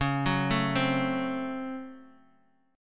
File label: C sharp Major 7th Suspended 4th Sharp 5th